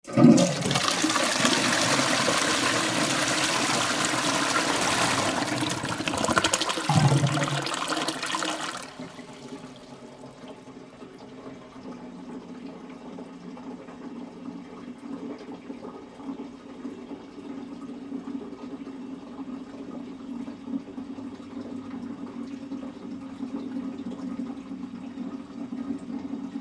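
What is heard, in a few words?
Toilet Flush Domestic - Flushing and filling; Bathroom; Tom Hutchings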